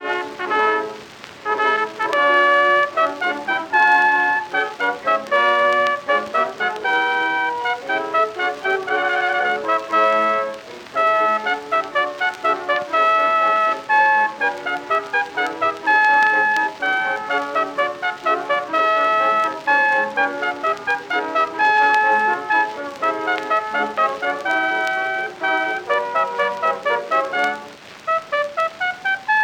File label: The trumpet shall sound; Messiah; loc-edison